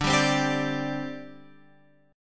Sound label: D 7th Suspended 4th D Guitar Open F; strummed; x,9,7,7,10,9; chord